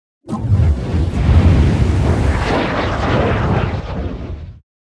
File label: Dragon Swoosh; netent